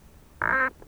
Tropidaspis, treehoppers; Cocroft

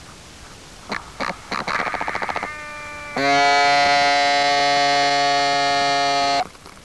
Platycentrus, treehoppers; Cocroft

